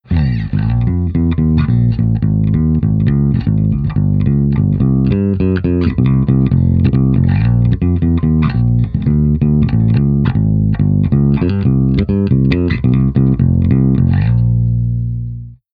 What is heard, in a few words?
bass amp